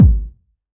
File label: BD, BASS DRUMS; EdgeSounds